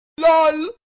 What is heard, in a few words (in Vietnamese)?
am thanh thong bao vui nhon